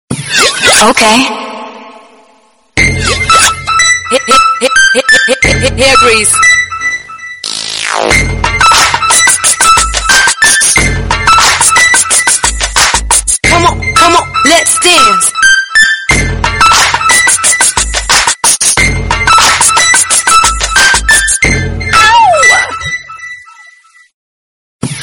classical background music tone; nokia ringtones

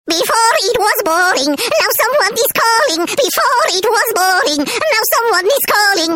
funny clown ringtone; funny ringtones

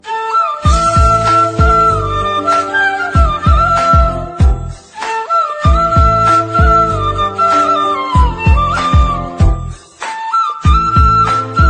new romantic instrumental ringtone, bollywood

